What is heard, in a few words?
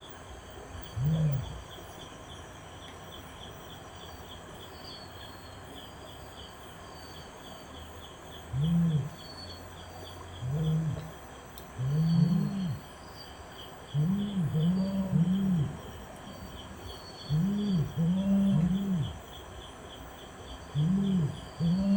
duet